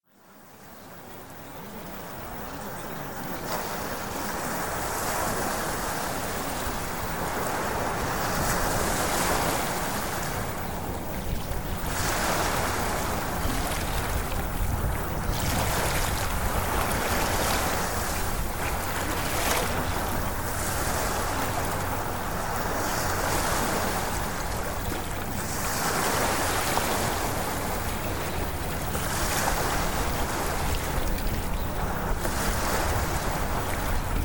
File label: Ocean Waves